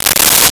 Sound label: Tape Measure Closed; Tools